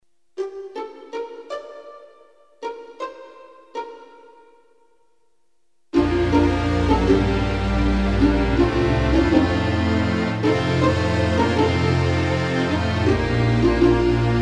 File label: instrumental